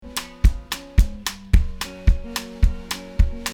drum

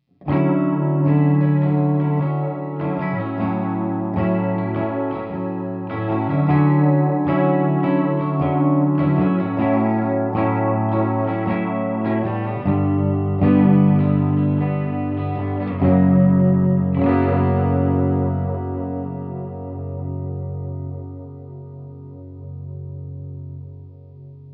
TAPE, lo-fi sounds

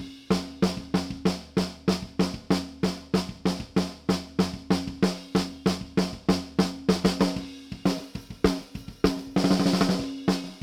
Snare ONLY NO EQ

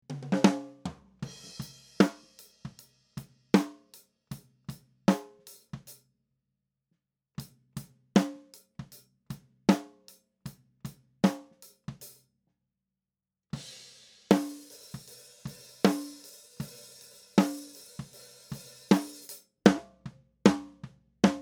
Section C Snare

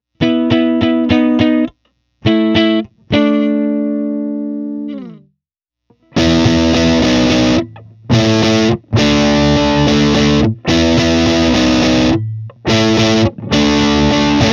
Marshall DriveMaster LP Neck PUP

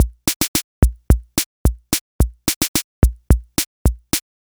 Enka B

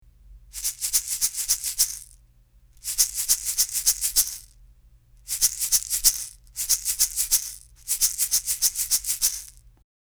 Shaker UNCOMPRESSED; Cascade Fat Head II

